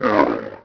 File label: camel short; Ambient; MX vs ATV Unleashed